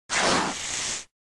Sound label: Monster SFX